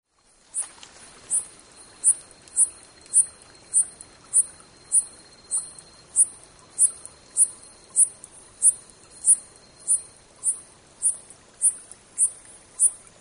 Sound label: taiwanumaoi call, yambaru